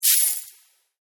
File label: buy sell